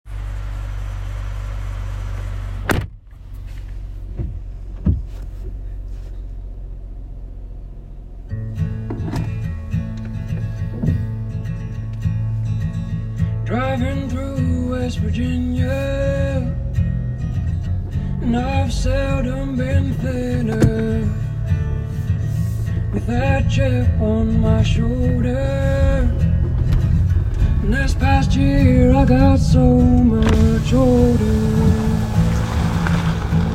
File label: Driving